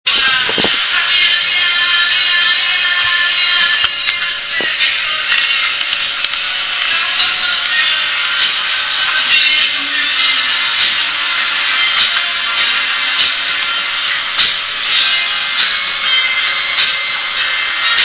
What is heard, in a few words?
music